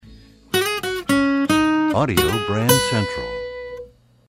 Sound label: Desert Wind, radio jingles